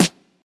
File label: sharp snare sound f key, Royality free steel snare drum sound tuned to the F note.